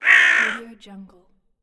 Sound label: crow cawing